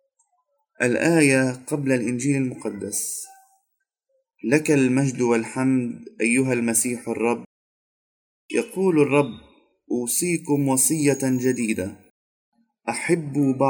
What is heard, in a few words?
gospel antiphon; Holy Thrusday; mass of the lords supper